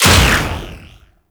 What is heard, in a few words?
Artillery Barrage Shot; Archwing